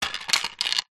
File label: household broom plastic fall over